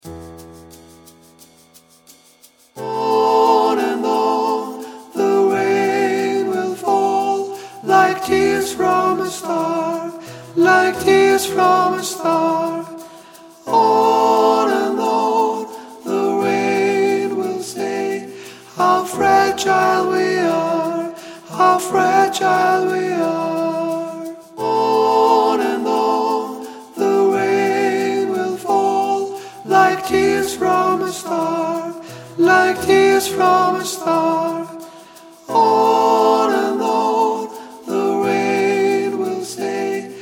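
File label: chorus sopran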